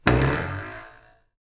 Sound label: spring, mechanical sound effects